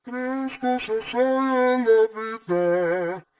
soprano

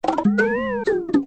conga

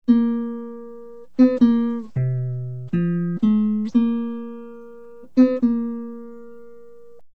guitar

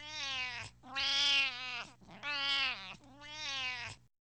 cat

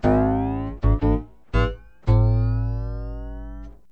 piano

